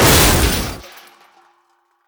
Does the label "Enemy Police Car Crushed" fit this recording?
Yes